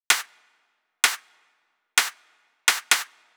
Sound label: Drum Loop 128BPM Clap; Loop Elements; VEC5 Drumloops; VEC5 Multi Loops 128BPM